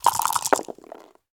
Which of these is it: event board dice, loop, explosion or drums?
event board dice